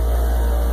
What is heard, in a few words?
up run, elevator; menards